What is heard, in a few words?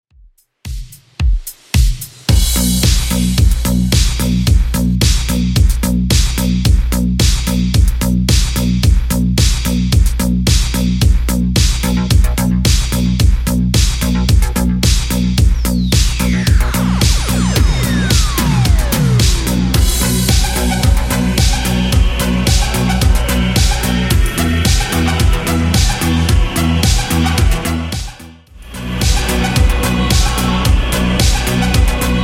80s Redrum 110bpm CLEAN